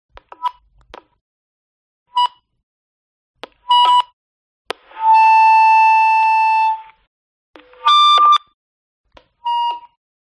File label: Walkie Talkie Kenwood TK 3107 FM Feedback; lesser vibes